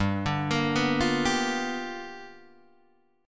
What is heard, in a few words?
G 7th Sharp 9th G chord, Guitar Open D